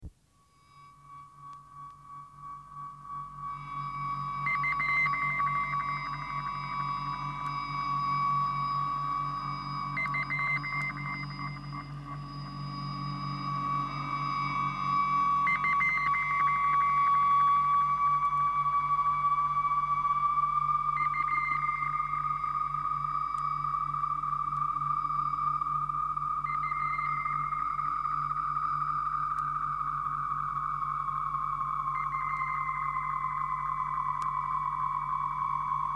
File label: sound going through space